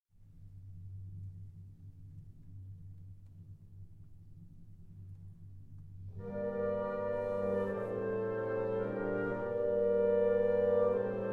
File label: Violin Concerto No. 1 in G Minor, Vorspiel, Allegro Moderato